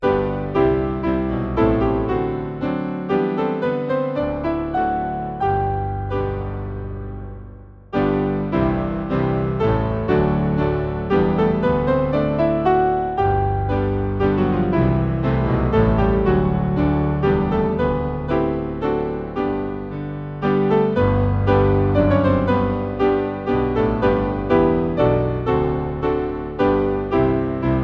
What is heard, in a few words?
Fancy Piano, hymn; Hymnal 1982